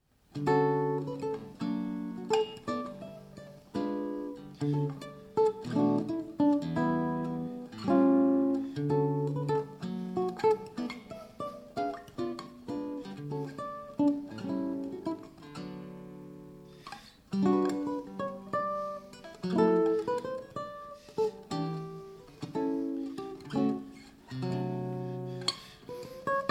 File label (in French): Ballade Irlandaise